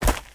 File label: footsteps; smite; god merlin